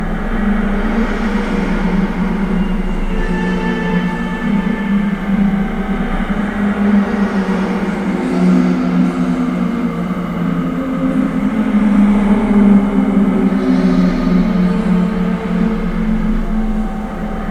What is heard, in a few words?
brazier ghosts loop